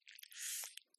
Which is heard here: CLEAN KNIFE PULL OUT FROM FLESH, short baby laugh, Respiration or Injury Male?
CLEAN KNIFE PULL OUT FROM FLESH